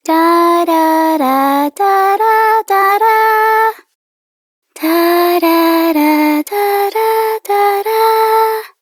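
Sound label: Child Rhymes Hum, Child Female; Duviri; Childrens Rhymes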